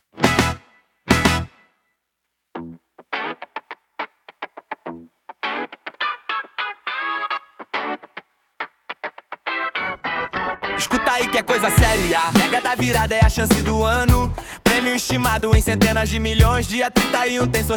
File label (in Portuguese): Funk; Mega da Virada